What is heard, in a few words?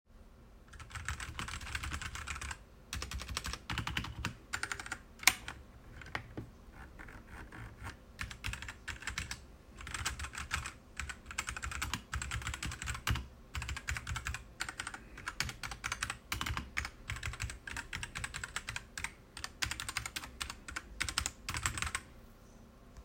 Redragon Ucal Pro K673